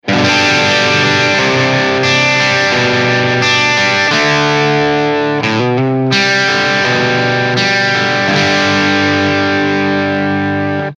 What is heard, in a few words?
Engl